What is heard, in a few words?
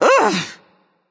"ugh"; smite; yemoja